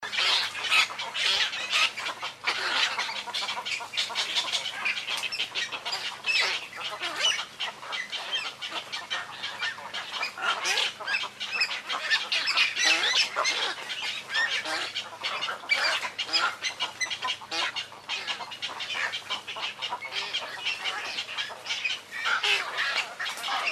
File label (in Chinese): 黃頭鷺